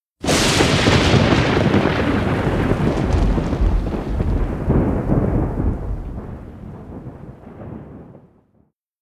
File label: Thunder